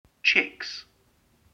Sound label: chicks; Matching game